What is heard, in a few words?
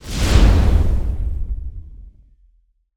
fires swoosh